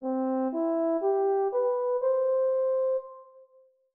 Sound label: 5 notes 13571 C